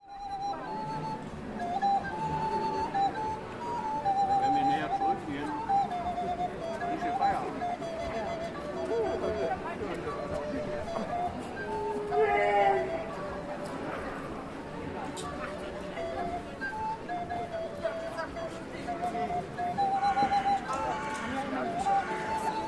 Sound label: recorder player busking; universitatplatz